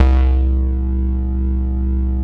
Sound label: BASS, 160 BPM, Drum & Bass; Ueberschall; Drum & Bass Flash Trackz